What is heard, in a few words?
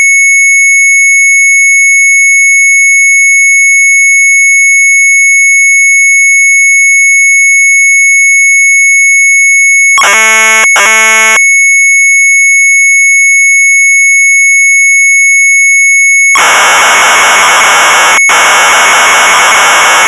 MZ800 Tape; TEST4; sharp; retromelsarchive